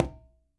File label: Quinto, Percussion